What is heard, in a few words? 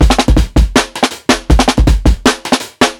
PICCOLO 80 32 BEAT, drums; LOOPS03; breaks; Basement drums vol 2